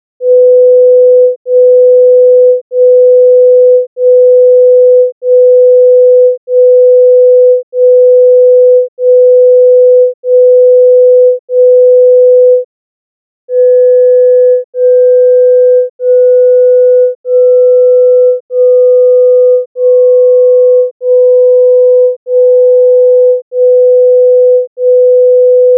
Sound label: High Sines Mask Low Sines Mask